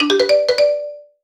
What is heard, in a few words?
Solo Xylophone Positive; MUSIC EFFECT; startide